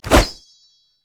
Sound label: basic attack; Kennen